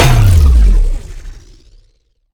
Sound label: Sciweap Shot Large Blaster Gravity Disintegrator